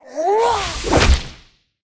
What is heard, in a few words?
troll attack, monster